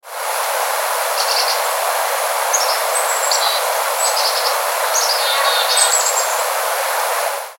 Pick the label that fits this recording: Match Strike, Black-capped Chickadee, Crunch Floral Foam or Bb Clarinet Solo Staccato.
Black-capped Chickadee